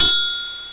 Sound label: temple bell; TamTamMicro